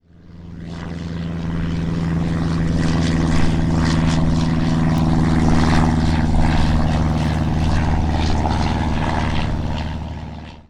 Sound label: airplane